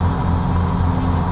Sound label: scan; 1997 MMX LAN PACK (win 95)